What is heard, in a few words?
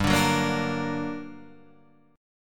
G-Minor Major 7th, GmM7 chord; strummed; 3,5,4,3,3,6; JGuitar